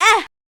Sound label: combo break; XooMoon